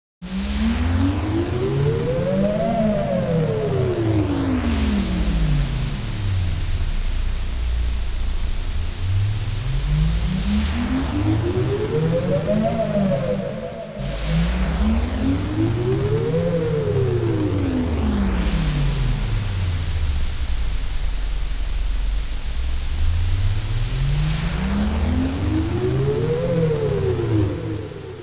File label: effects 4 voices